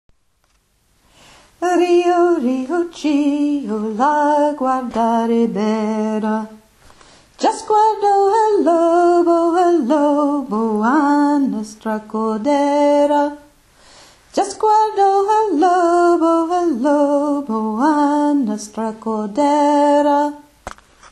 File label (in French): voix basse version lente